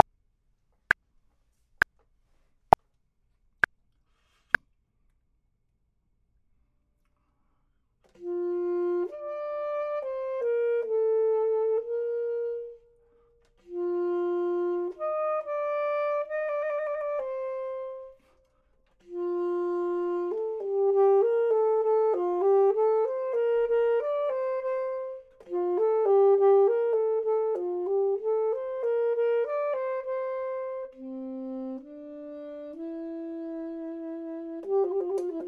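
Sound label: bottom, Saxophone and Clarinet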